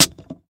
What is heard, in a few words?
Bottled Drink Twist